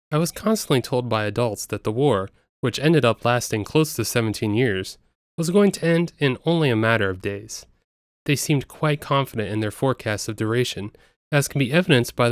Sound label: Vox